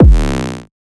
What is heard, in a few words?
kick crazy; Drum Sample Pack; One Shots; Slate; SEISMIC